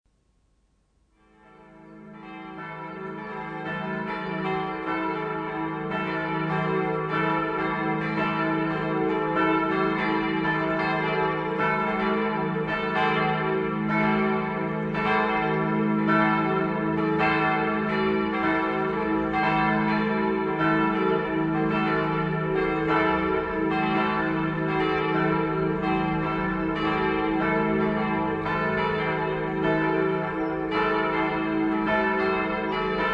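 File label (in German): Tonaufnahme e1 g1 a1, mehrstimmige Geläute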